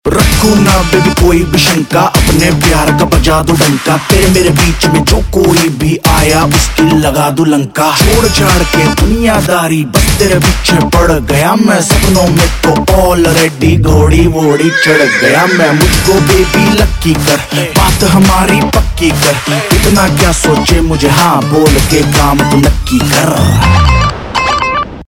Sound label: Rap Ringtone, Bollywood; Badrinath Ki Dulhania